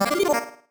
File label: marimba dice; ゆめ2っき